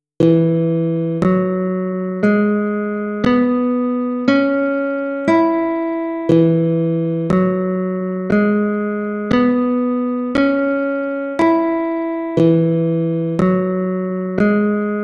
E Major Pentatonic Scale Guitar

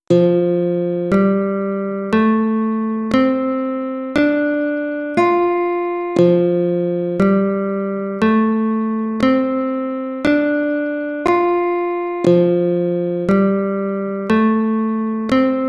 F Major Pentatonic Scale Guitar

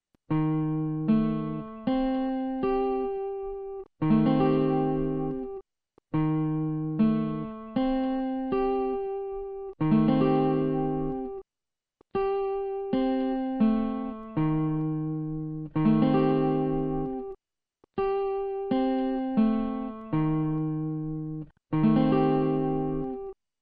cm6 guitar chord

